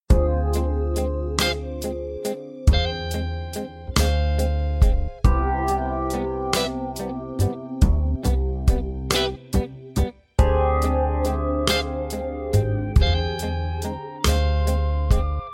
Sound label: Aeolian Flat Five Groove